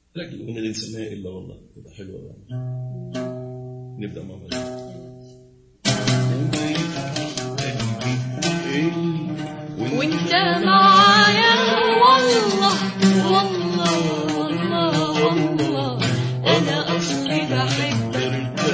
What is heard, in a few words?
Aod; ArabMelody